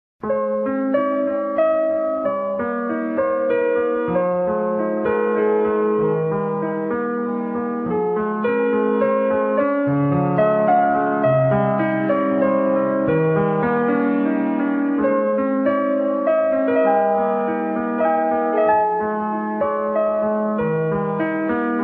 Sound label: musicbox